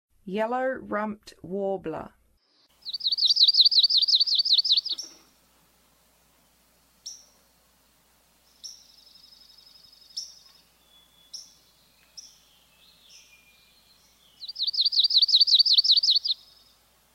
Yellow-rumped Warbler, Songs of Birds of North America; Western USA; Western USA Songbirds